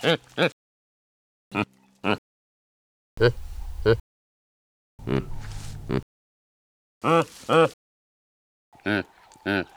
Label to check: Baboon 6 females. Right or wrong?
Right